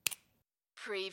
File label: pen remove cap